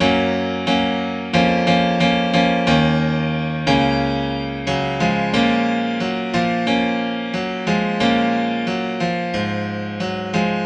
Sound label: Grand Piano 90 BPM (F# Major); Morning Dew; Ghosthack; Free Guitar And Piano Samples